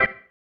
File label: Stab One Shots, Organ